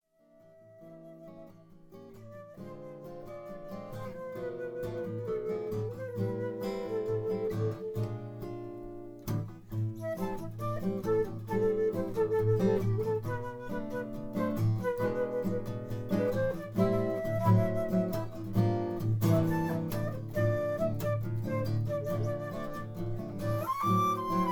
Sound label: Gentle Rain